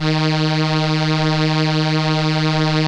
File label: ARP STR ENSL, First Call Vintage Keyboards